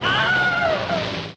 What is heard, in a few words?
Cowboy Boos; Pitch Black Audio Pong